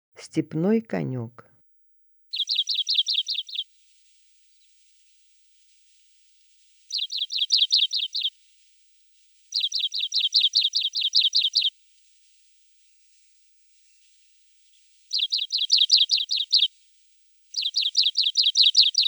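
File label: stepnoy konek voice, Richard's pipit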